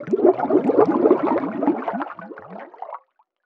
Underwater Water Splash Gurgle Bubbles Air Valve SCUBA